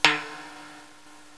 Drum

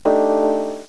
Menu Bar

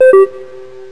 Triangle

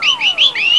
Beep

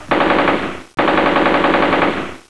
Machine Gun

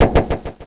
Thunk Echo

